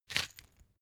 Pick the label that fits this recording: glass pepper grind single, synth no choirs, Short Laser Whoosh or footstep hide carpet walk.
glass pepper grind single